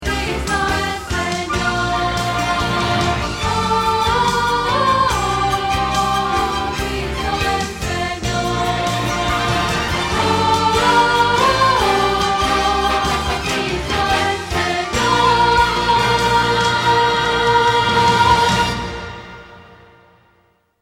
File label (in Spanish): Contralto